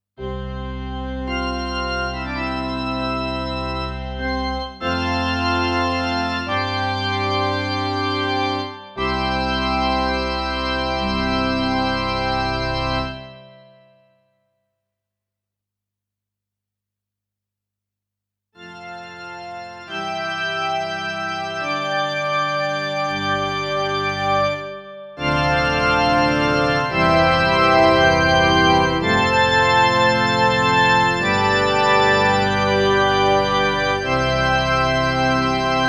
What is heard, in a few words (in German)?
Kirchenorgel